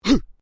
MALE VOCALIZATION HUH; Exclamations; Voice

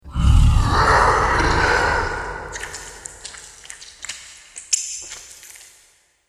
Hellhound Roars And Eats, Monster

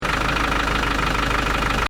Engine: Tick Over Revs Loop, car; Diesel; Cars; Vehicles; Stuart Duffield

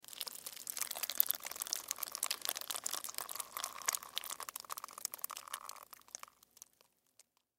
Horror Blood Spill Splatter Long Drips; Injuries; Combat; Blastwave

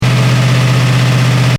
Engine: Medium Revs Loop, car; Cars; Vehicles; Stuart Duffield

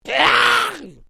Created Creature Small Cry, Alien Sound Effects; Created creatures, vocalisations and pretend speech

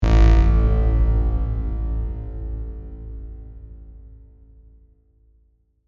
Digital Horn; Production Element; Digital (Sound Effects:Sound Design)

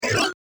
Electronic Video Game Collect Sound; Video Game Sound Effects; beeps, bleeps, blips

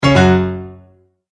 multimedia tone error

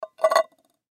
Tin Can Set Down, Tin Can Set Down Wobble Empty; Tin Can Empty Set Down Wobble Cement; Set Down Sound Effects; Foley

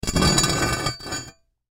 Metal Pole Scrape Drag Movement Cement Heavy; Foley; Blastwave

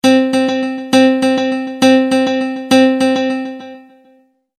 Multimedia Tone-Thinking/Processing; McKinney Sound

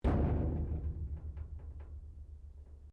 METAL THUMP QUIET; Metal (Sound Effects:Materials)

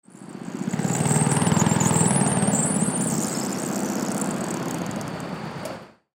Go Kart Pull Up, Go Kart Pull Up Shut Off; Go-Carts; Vehicles

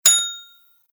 Hotel Reception Desk Bell; Bell Sound Effects

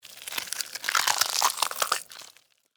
Flesh Rip Slow; Horror; Blood And Guts Sound Effects

